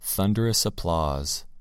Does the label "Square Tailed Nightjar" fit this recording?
No